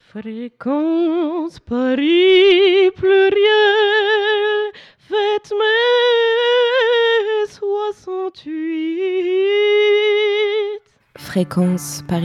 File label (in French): gingle lyrique rock; mai 68